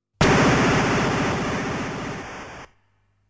Player Death SFX

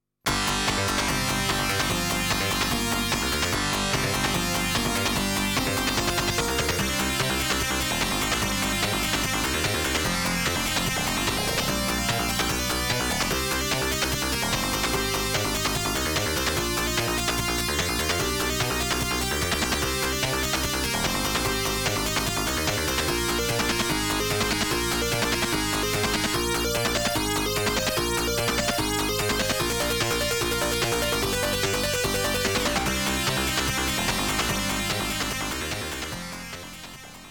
Battle Ground; Music from Ys

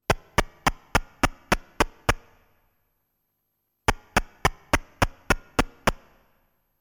Stairway Climbing

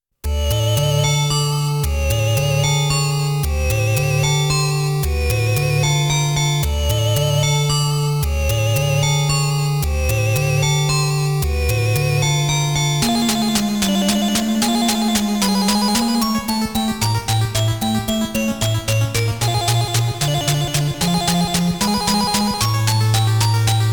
BGM

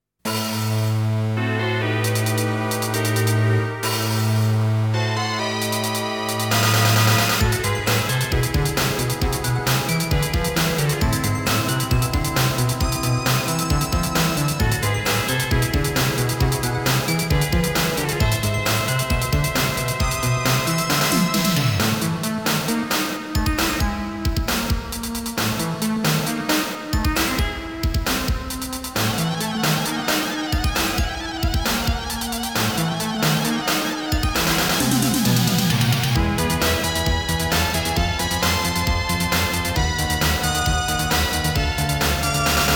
BGM

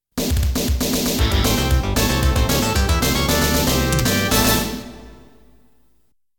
Stage Clear

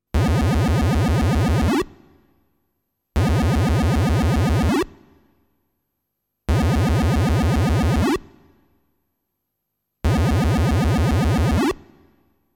Attack; Gilalardy

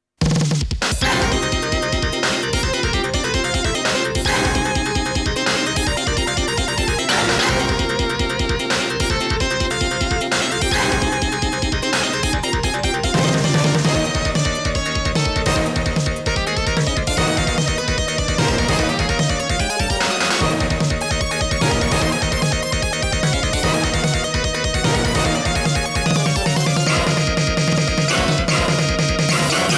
Boss BGM

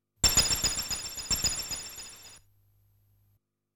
Evil Bell SFX

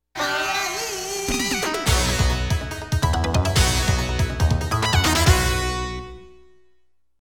Player 2 Victory BGM

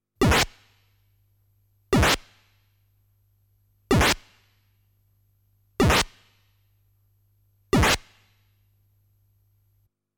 Defeated Enemy SFX

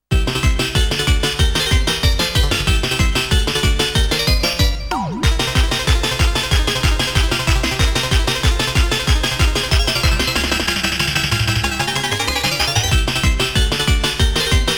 Warning BGM

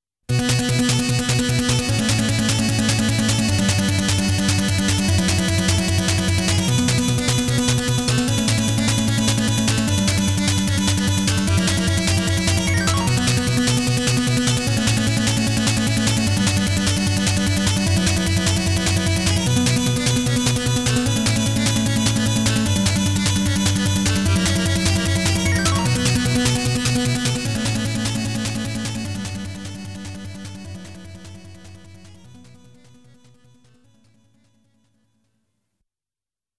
Boss BGM

